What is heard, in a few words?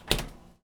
Foley